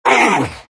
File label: big pain; Undying